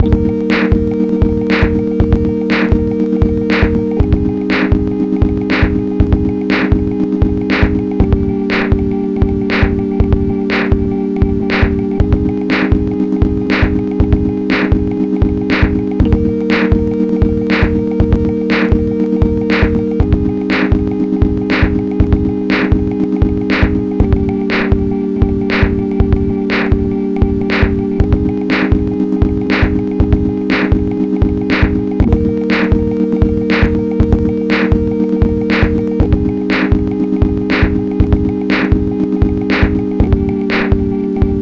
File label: Sine Wave